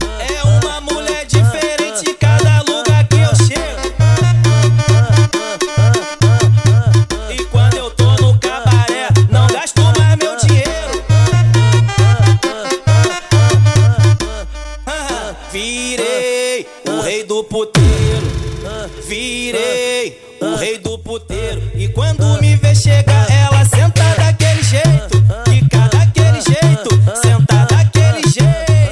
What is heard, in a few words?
funk bass